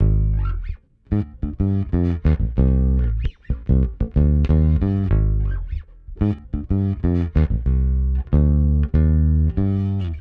Bass Hip-Hop

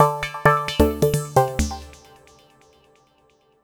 Lead Synth

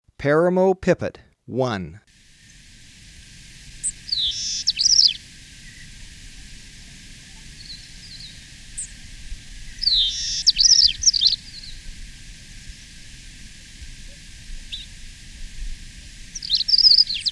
Paramo Pipit, Birds of Ecuador; S. America

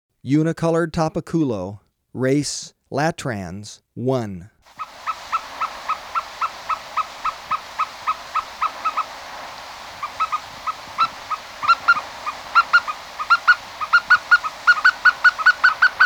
Unicolored Tapaculo, Birds of Ecuador; S. America

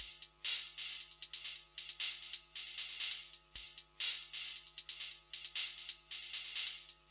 Drums Techno

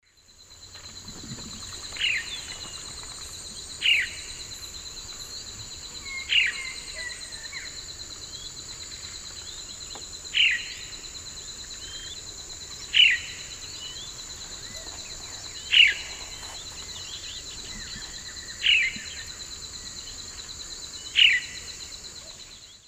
Cacicus cela, call; S. America; Eastern Lowlands

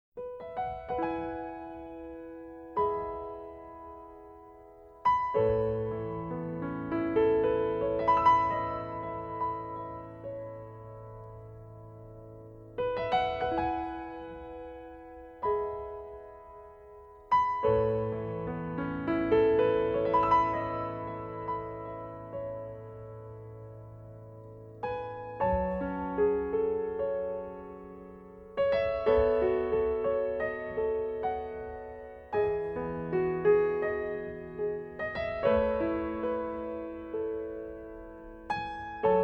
Piano

